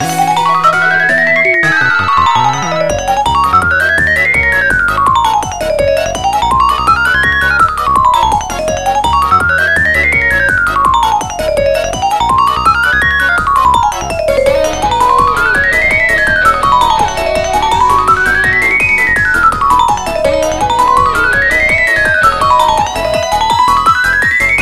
Music Park (Final Lap, Vibraphone); Mario Kart 8

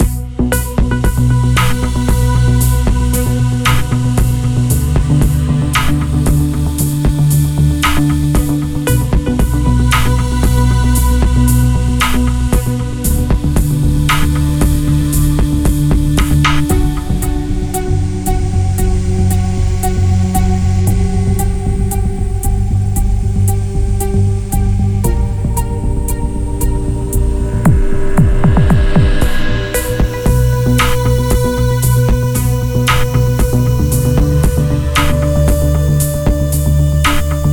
Tense

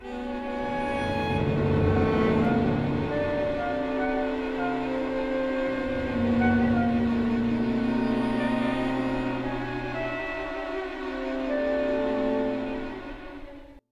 Restaurant - Combo Oldman Pad; Bee Movie Game

